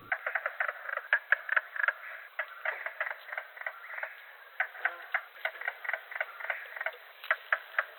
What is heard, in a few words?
Mink Frog